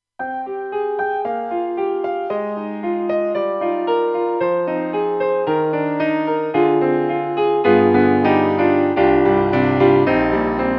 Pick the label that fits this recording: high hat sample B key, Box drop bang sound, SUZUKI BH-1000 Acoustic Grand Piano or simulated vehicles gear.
SUZUKI BH-1000 Acoustic Grand Piano